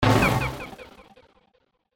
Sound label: Blast Flutter